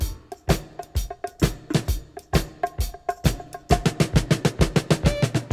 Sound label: 131 bpm modern drum beat f sharp key